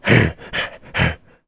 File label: breath